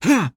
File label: Male Attack Short; SandBox Musics